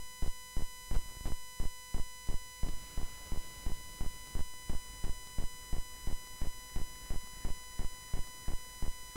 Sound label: 8MHz SPI 16bit No DMA